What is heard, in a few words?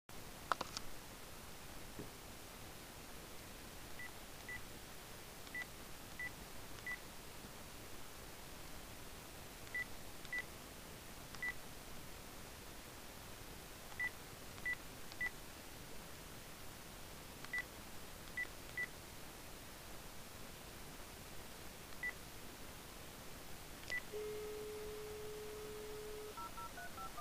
law firm telephone made a strange sound